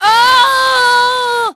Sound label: falls; VOICE; CARENA; Pulse Racer